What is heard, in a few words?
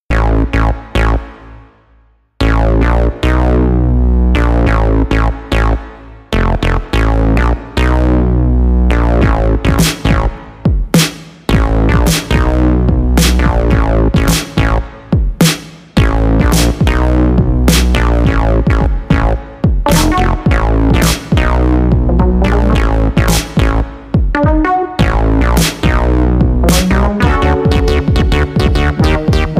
Funk, Beat